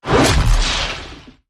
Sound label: Hit Big Swish